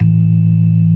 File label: CLASSIC C3, Synth and Organ; InVision Interactive; Keith Emerson; The Most Dangerous Synth and Organ; CLASSIC C3 4